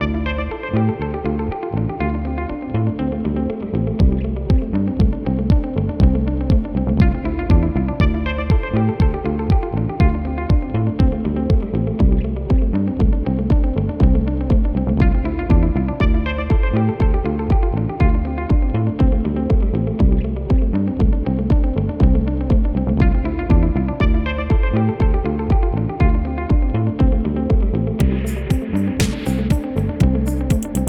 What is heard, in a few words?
SINWAVE